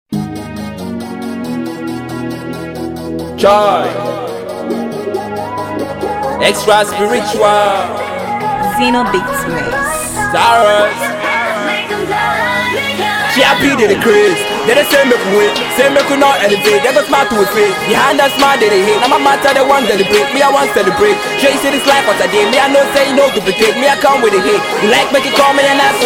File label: Applause